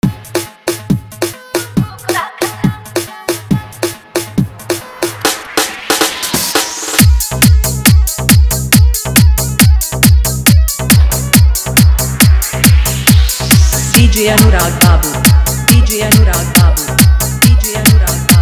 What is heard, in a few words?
Bhojpuri Hard Bass Dance Mix